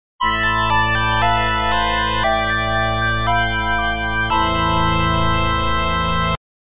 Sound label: Game Over; Strider; Genesis